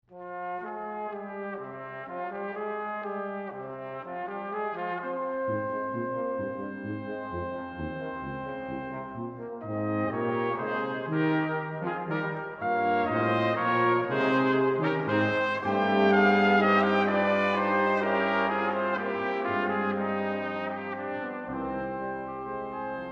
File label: Quintet, Intermezzo, Brass Quintet; Atlantic Brass Quintet; A Musical Voyage